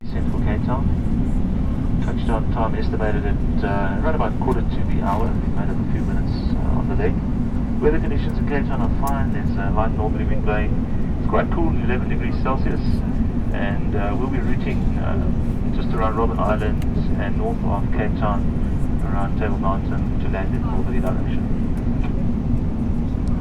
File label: Landing In Capetown